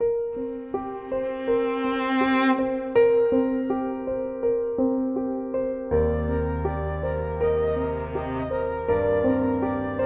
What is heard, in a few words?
thaw music only; Walden